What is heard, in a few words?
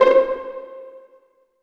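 C 4 pizzicato, STRNGS; AKAI S-Series CD-ROM Sound Library VOL-8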